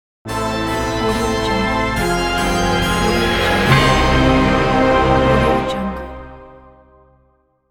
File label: halloween scary logo